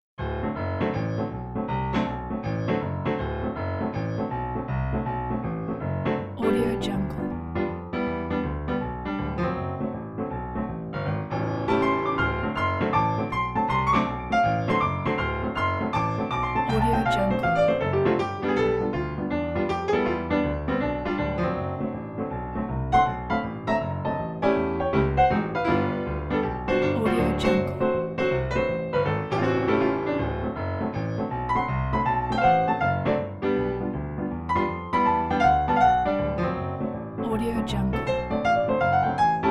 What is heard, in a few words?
rock roll piano